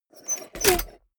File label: mechanics small snap